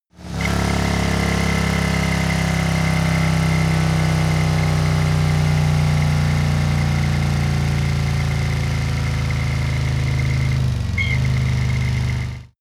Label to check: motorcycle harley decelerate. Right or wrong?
Right